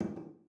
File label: bottle down; goldentavern